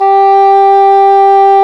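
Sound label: English horn, woodwinds; Roland - LA Composer vol. 1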